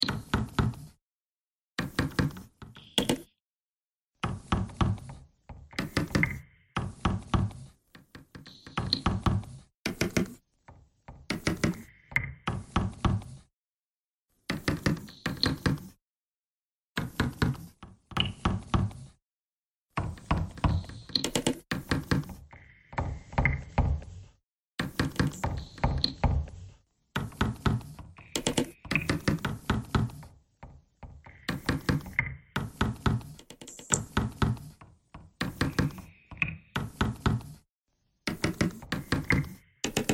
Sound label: bagpipe noise